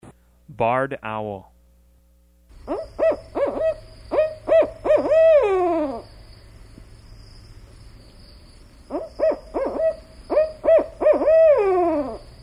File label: Barred Owl